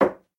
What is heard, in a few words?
Hard Coffee Cup Put